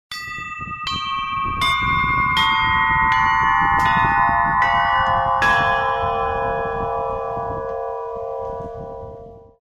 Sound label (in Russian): zvuki, kolokola; Novosol